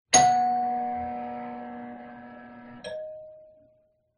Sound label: Door Bell